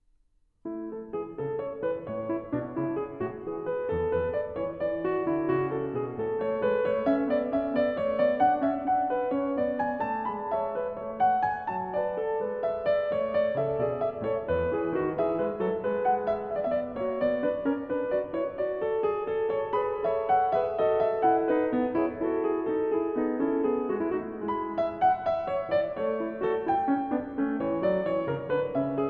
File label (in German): Präludium A-Dur; BWV 888; J.S. Bach; Das Wohltemperierte Klavier Book 2